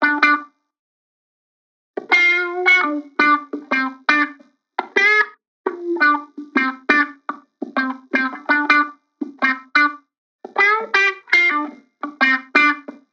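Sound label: 85 Electric Guitar Loop D minor; Meaning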